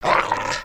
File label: Pet Terrier Growl; creatures pets